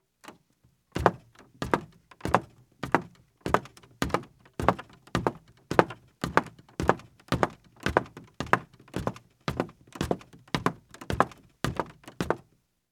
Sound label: Footsteps Heels Wood Down